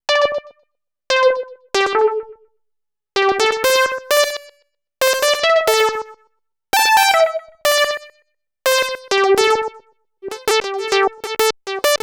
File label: Digi Delay